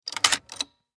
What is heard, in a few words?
Technology Cassette Player Eject Tape; Tape Players; Electronics